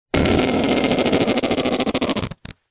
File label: leisure whoopee cusion squeeze fart wet long